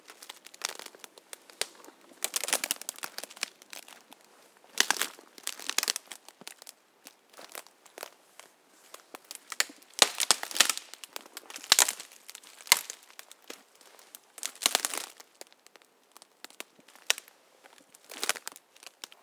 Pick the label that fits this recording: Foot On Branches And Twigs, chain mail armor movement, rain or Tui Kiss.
Foot On Branches And Twigs